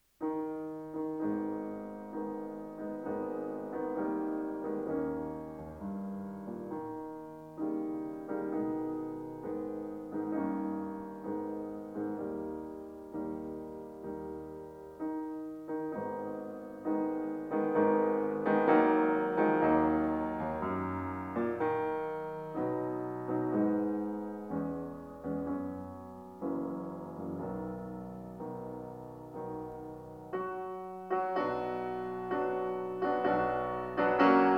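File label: Piano Sonata No. 12 in A flat major; Wilhelm Kempff; Beethoven; Piano Sonatas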